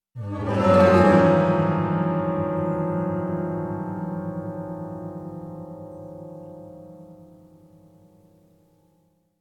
Scary Piano Scraping Effect; horror sounds; Hollywood Edge

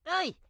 grandma short yell; Blind Drive

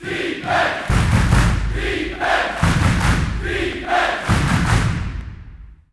BBall Crowd Defense; Crowds

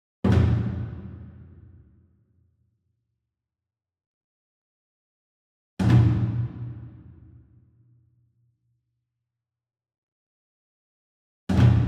ENSEMBLE DRUM Flam Far; boom library; Cinematic Strikes Construction Kit

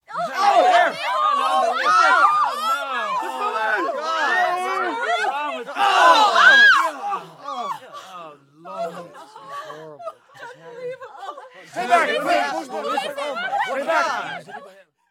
AIRPLANE JET F16 PASS OVER; Blind Drive

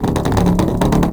Golf Ball Roll Metal Pipe; Misc Sports

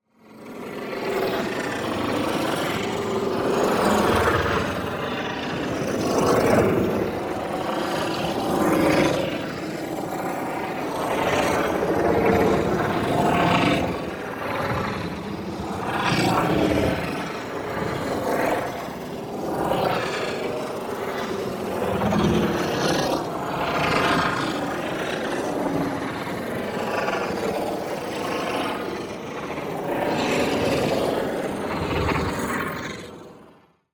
PARTICLES Unwanted Transmutation; boom library; Matter

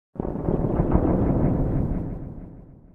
NOIS; XXL 800 Production Effects

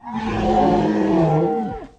Dinosaur Growl

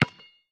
Street Ball Bounce Concrete; SFX Kit

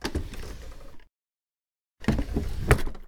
wooden drawer open&close1; NS Studios - ultra sound collection

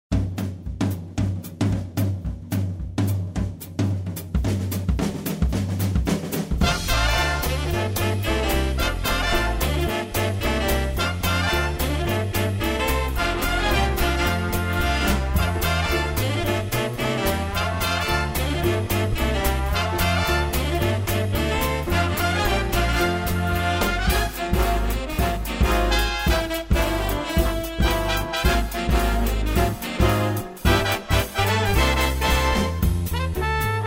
JAZZ STND BASIES UP; royalty free music; podcast production toolkit

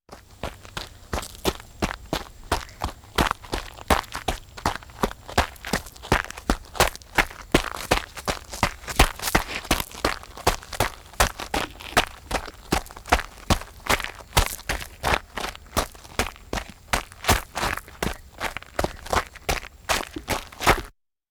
Walking Machinery Trucks

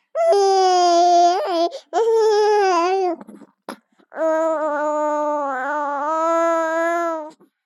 BABIES WHINE Boy 3 months Uncomfortable; Baby Boom

